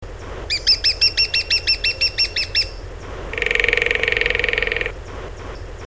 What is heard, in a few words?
Dendrocopos major